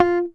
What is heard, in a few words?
GTR TRIK, Jazzistic; Univers Sons